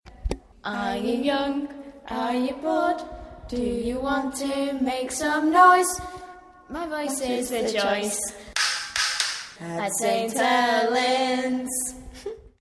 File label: jingle; myvoice